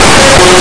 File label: pda off